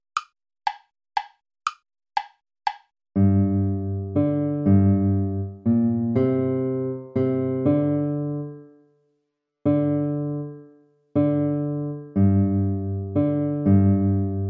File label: baroque minuet minor play along